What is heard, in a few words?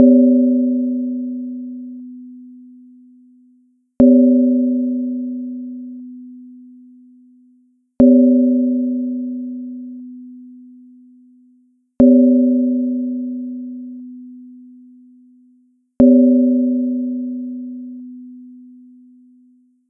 Traditional Asian Percussion